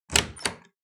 Door handle open; Phasmophobia

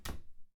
Cabinet Close; Phasmophobia

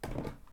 Stairs footsteps; Phasmophobia

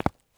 FOOTSTEP Trainers Asphalt Run mono; Phasmophobia

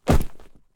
Bag drop; Phasmophobia

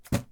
Throwing; Phasmophobia

